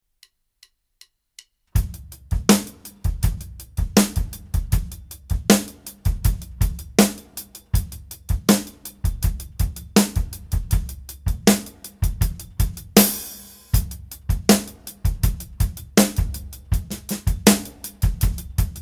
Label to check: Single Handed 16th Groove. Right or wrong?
Right